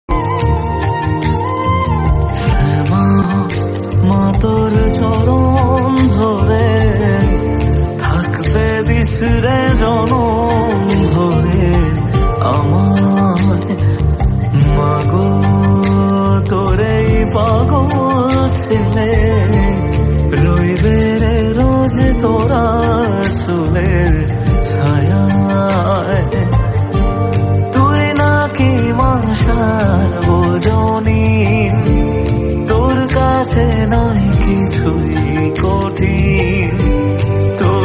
devotional bengali

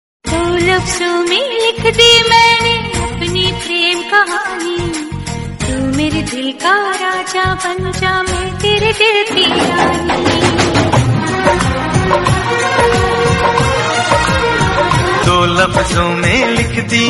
hindi love song

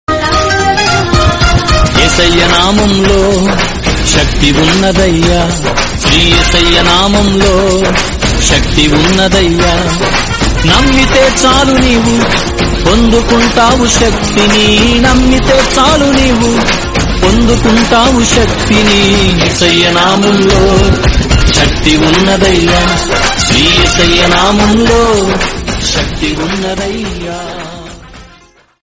jesus song, christian song

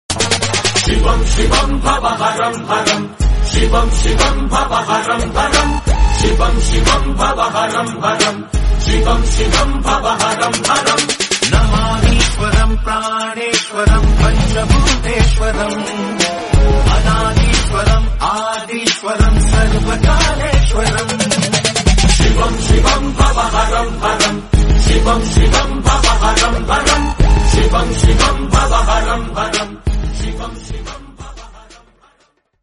hindi devotional

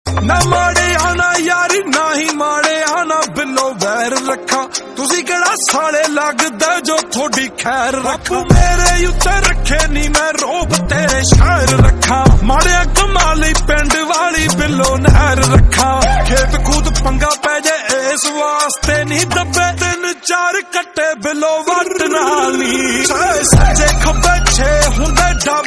lofi song

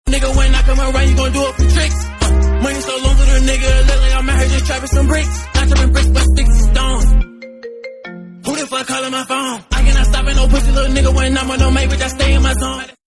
song english remix

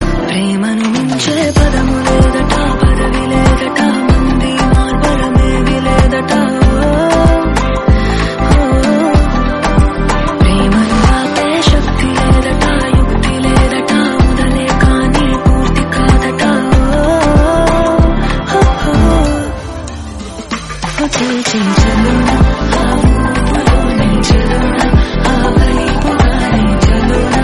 female version telugu; jawan

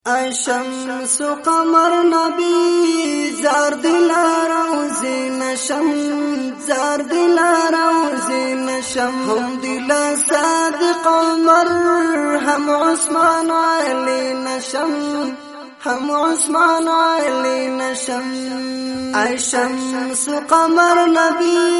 pushto naat

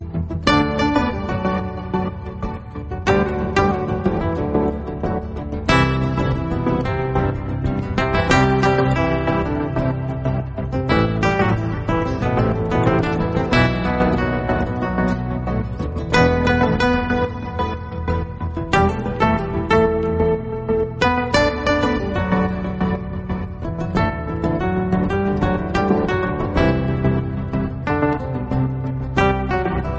guitar instrumental

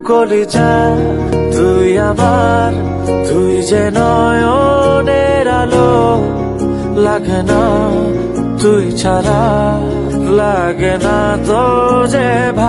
bengali song

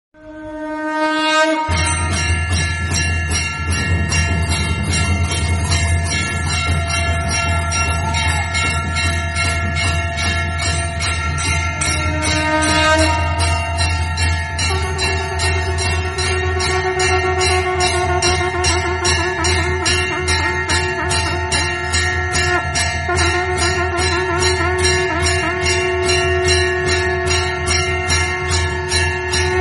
temple bells ringing

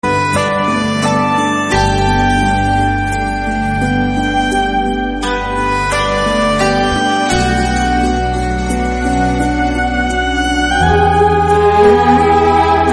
instrumental bgm; the warriors way

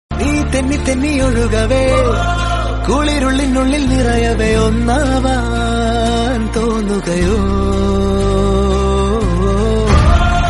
malayalam

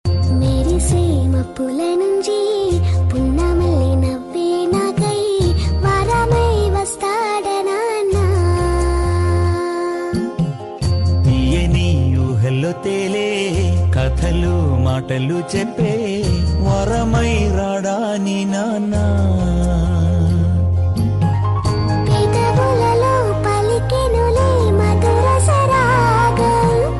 song

